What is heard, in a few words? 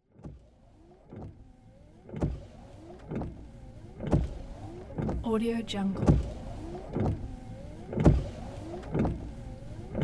windshield wipers